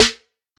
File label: shiny acoustic snare sample a key